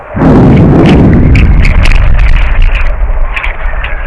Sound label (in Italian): olmeto grecia, Sonificazioni Eventi Sismici